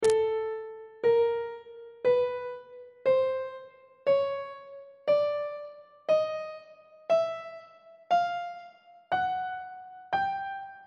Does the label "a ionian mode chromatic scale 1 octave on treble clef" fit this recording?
Yes